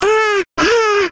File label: Classic Miss Sound; Jolly Battle; Android